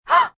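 Gasp Short Female, Gasp, female, short (human voice); surprised; Gasping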